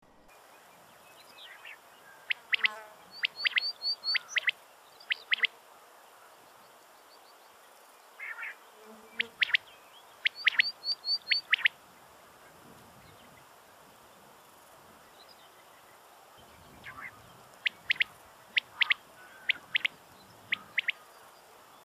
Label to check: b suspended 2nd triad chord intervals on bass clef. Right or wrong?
Wrong